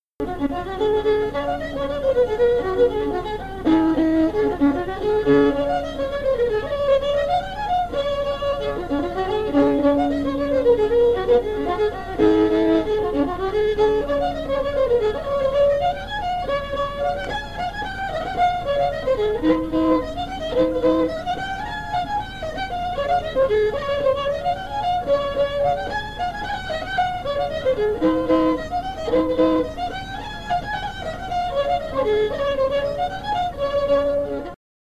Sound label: fiddle; SeamusGrantFestival; tune tutor